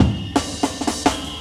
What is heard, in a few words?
170, Breaks; Drum&Bass; EZ Rollers